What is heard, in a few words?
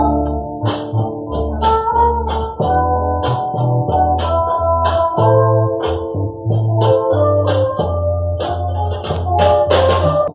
horns distant horn blowing, phonky loops